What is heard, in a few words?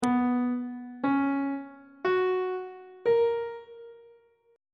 c flat major 7th suspended 2nd chord intervals on treble clef